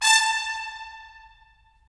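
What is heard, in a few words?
brass trumpets staccato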